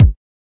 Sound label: kick